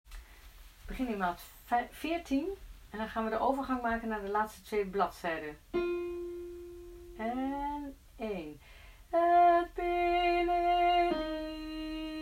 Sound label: Lead